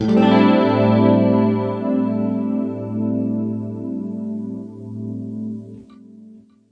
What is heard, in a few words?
clean guitar rich chord a